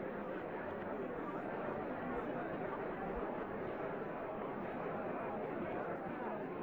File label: live, drums; virtual drum sounds; custom drums